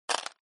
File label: roll show, backgammon